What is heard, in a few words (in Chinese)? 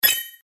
正确